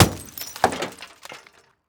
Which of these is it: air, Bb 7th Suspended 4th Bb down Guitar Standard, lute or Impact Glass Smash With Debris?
Impact Glass Smash With Debris